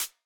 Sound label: hats C, hip-hop drum kit